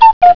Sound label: Cukoo Clock; Right On Time 2.6.4